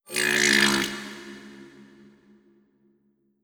Critter Female Verb

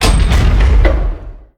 Pillar Down

